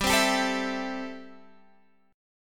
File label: A-Minor 7th G